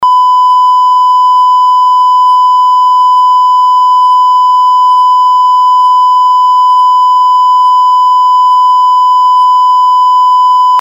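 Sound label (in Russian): звук цензуры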